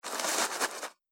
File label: Packaging Paper Noises; Foley; Andrew Fleming